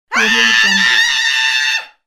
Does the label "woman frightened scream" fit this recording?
Yes